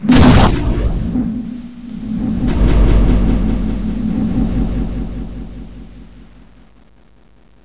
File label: fire; Hexen II